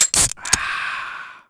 healthfill

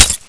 grenade wood bound

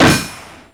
gun metal impact

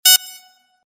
beep

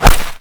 gun land impact

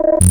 fail

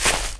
walk grass, FootStep; WarRock

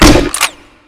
Javelin Fire; Weapons; WarRock

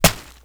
Fire

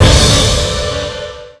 Round Lose

